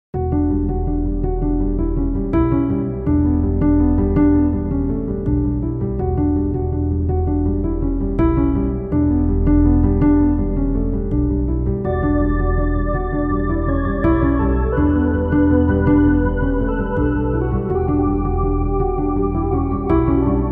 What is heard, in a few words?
Ambient Sleepy Riff